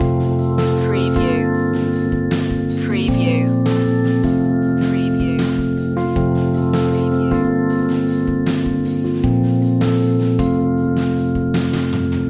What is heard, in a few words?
stay positive loop